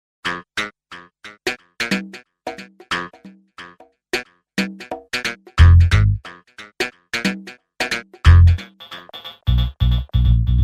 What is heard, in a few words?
hiphop